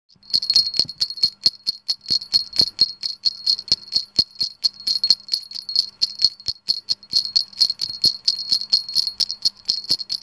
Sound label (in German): Glocke Bunt